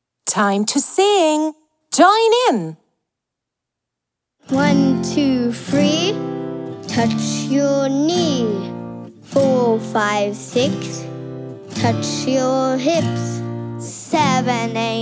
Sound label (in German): englisch song